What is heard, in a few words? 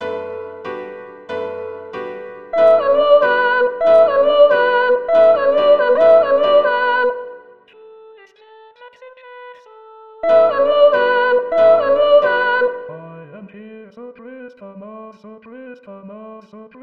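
Sir Christemas Soprano 1; Mathias; 2021 Xmas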